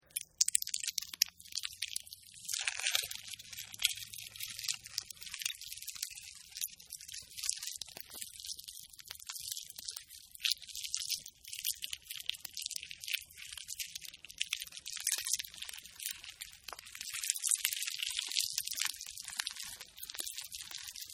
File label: Monster Hatch, Monster Hatching; Horror; Monsters